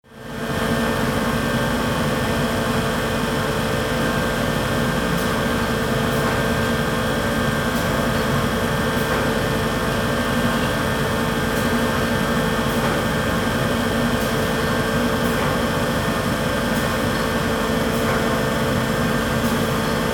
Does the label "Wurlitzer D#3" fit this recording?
No